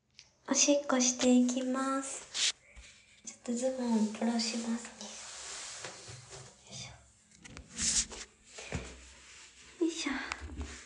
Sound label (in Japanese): おトイレで! オシッコですッ!